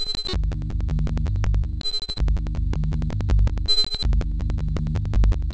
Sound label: buffer fuct loops